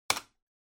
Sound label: Light Switch Selector Button Dry Hard